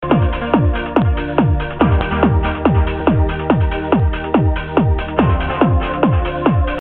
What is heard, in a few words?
slippy beat